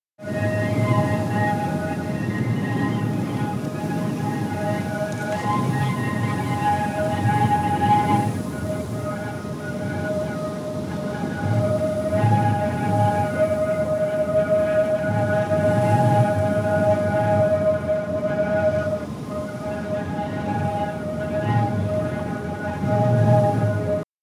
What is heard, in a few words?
whistling crush barrier